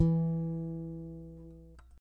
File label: string5 e2